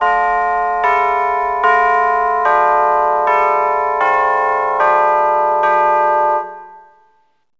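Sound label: tubular bells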